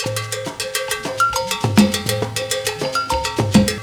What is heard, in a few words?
LATIN LOOP; Crypton - DrumBase5000